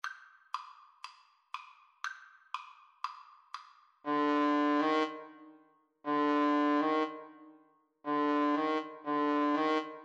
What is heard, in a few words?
Trombone Duet